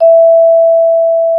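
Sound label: vibraphone E5; Xsample VOL-4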